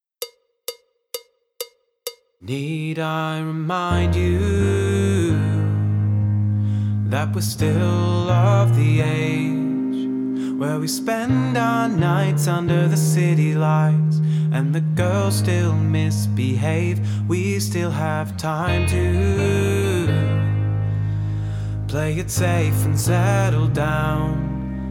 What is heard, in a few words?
backing track without click